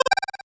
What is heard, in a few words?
8bit se